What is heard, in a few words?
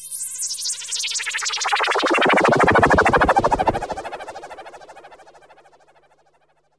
FLYBY, SYN. FLYBYS; Best Service Alpha Dance vol.2